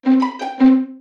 My Step, notifications

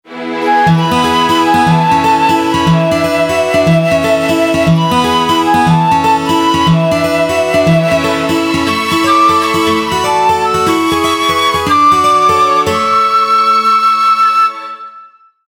Springtone, phonetones; Sony Ericsson; W960

